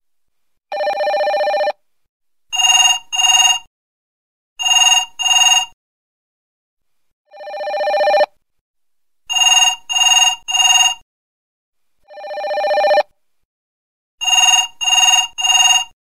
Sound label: Old Phone Tone Style